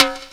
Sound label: Reggae, Drum Machines